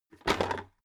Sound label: bucket drop handle ring